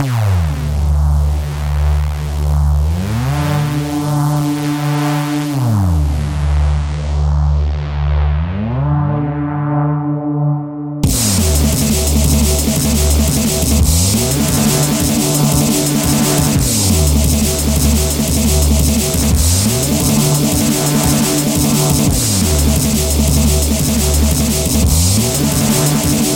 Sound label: reese bass